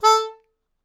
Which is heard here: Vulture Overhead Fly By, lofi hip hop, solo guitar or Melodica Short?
Melodica Short